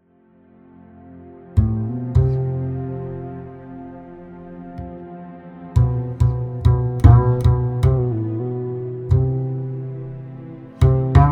Acoustic; Trilogy

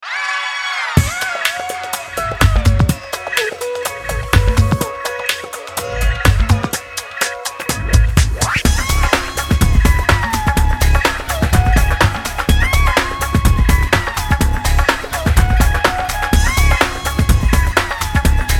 Ethno Style Fusion; Stylus RMX

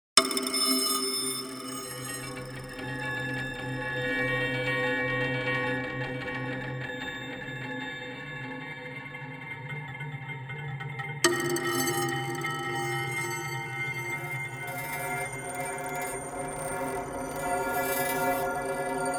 Dropping Coins; Omnisphere

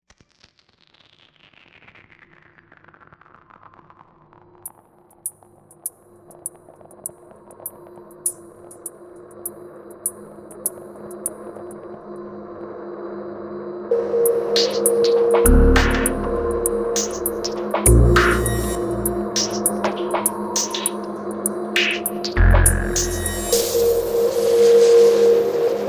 Glitchmosphere; Atmosphere

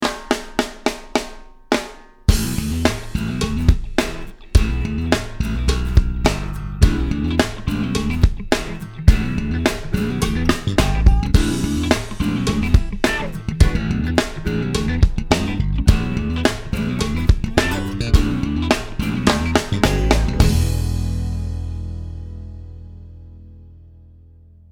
Backbeat; Cat Fight

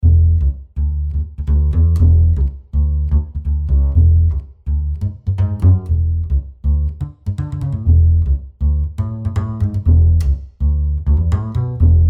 Acoustic mic; Trilogy

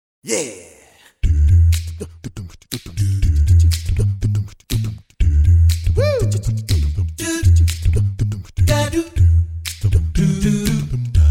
Jazz; VocalPlanet

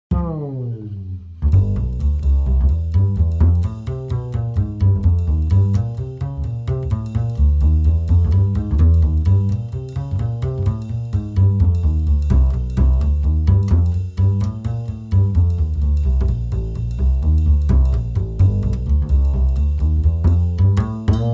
Acoustic Big Hand Swing; Trilogy

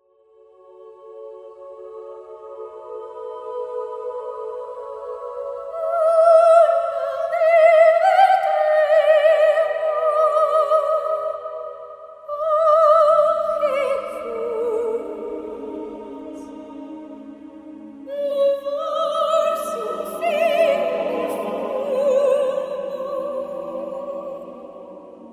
Sopranos

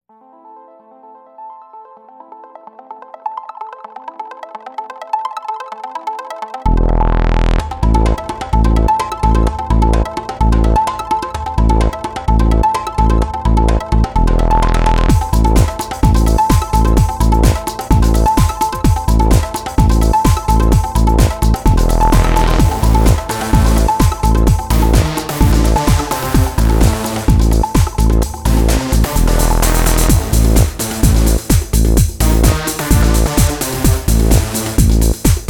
Synth; Trilogy

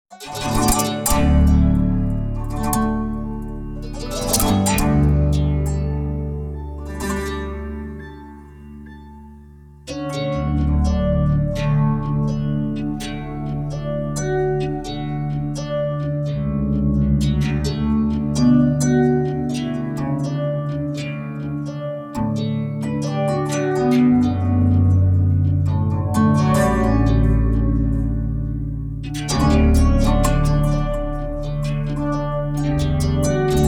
Bass; Omnisphere; Bedside Table

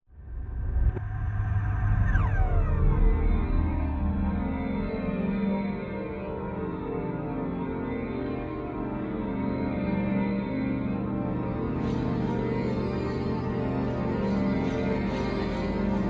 Distorted Reality

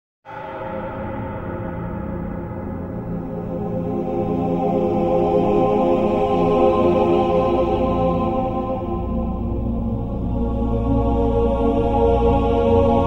Male Choir Swells

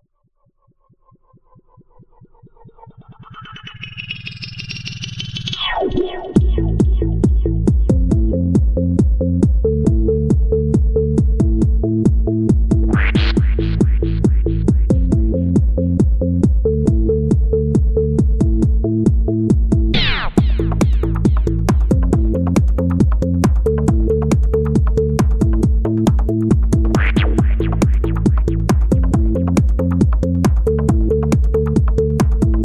UK House; Stylus RMX

